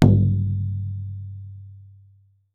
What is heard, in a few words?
FRAME DRU, FRAME DRUMS